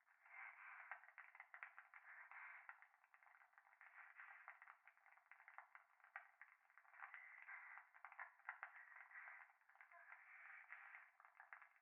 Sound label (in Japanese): 風呂 左耳密着吐息 暖炉